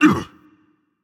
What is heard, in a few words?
grunt hit, dragon; fafnir